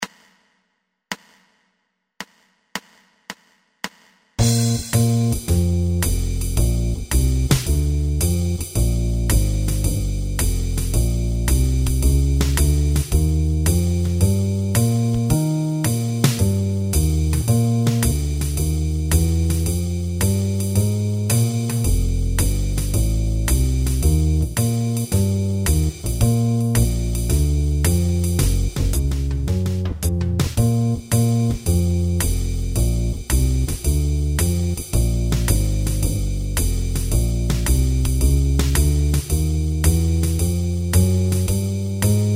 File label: swing no melody no piano no guitar